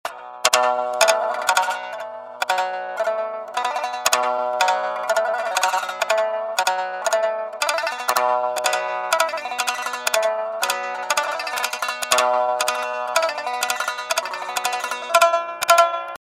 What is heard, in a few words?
Shamisen; phasegear